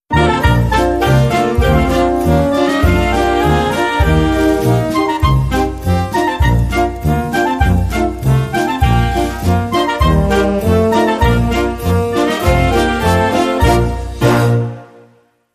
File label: Jingle